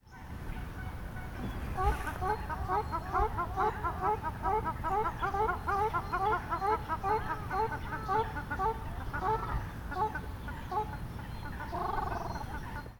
rostgans, vogelstimmen

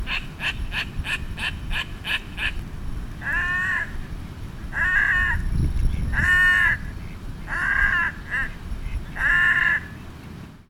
rothalstaucher

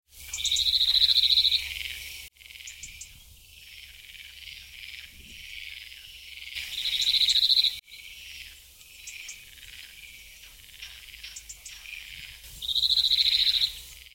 zaunammer

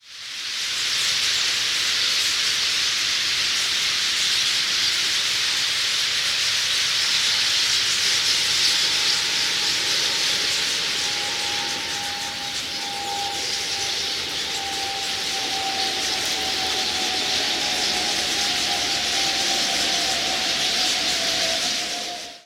bergfinken

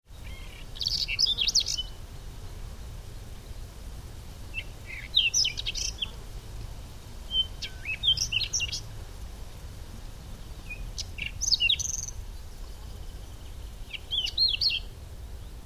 braunkehlchen

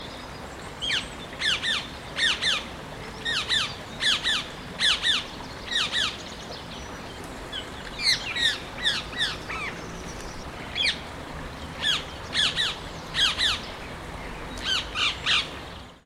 halsbandsittich; Psittacula krameri; Papagei Ziegenmelker

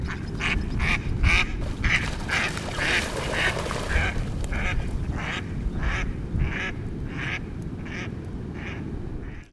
stockente

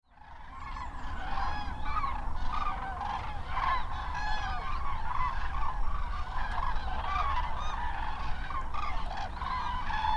kraniche, vogelstimmen

